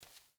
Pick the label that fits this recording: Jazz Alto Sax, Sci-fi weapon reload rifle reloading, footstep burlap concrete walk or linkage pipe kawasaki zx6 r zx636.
footstep burlap concrete walk